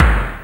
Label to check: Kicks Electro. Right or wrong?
Right